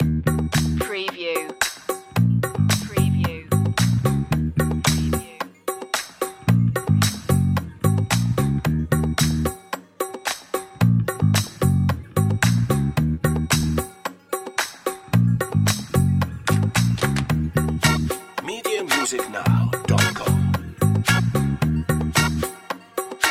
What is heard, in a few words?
ocean waves sparse, mesmeric